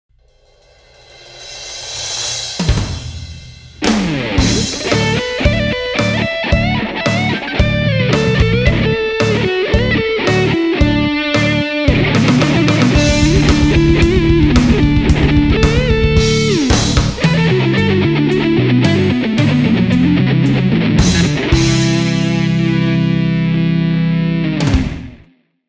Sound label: Xotic ENGL Lead